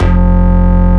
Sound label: Synths C; Vengeance Samples